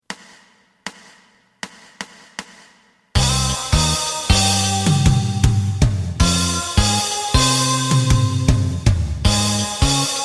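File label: side guitar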